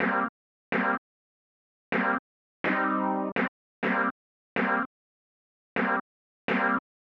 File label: 125 stab C, Keys, Nu Jazz House; Nova; PowerFX